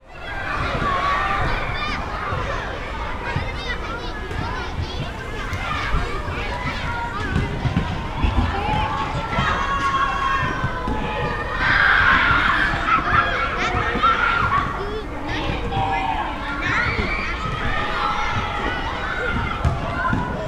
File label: Kids playing in hallroom ambience